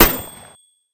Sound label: Hit